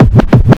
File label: Kick